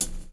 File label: HAT, Hip Hop; PlatinumPhattCD1; E-MU Producer Series Vol. 8 – Platinum Phatt